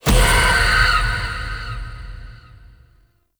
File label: Evolving Weapons Upgrade Success; Warframe; Zariman